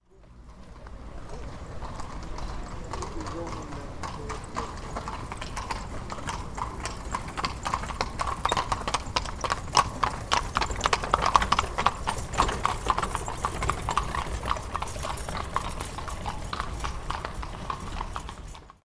Fiaker

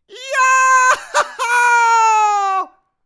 Jaaaaa Hurraaaaa

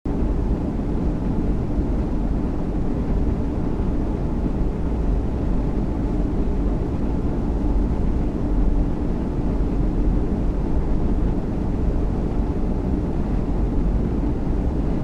Ventil

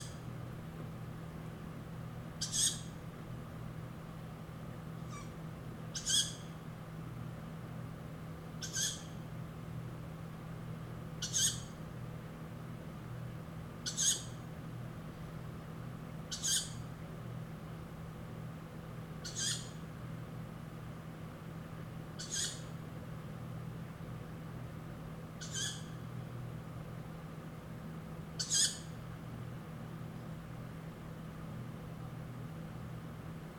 Waldkautz

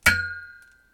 Metall Tischbein

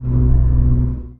Brummen

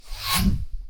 Schniff

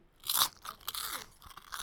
Chips Essen

